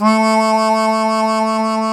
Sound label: HARMONICA